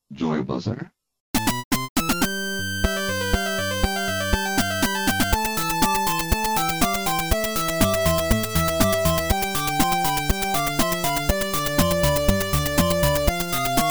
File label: 8 bit mix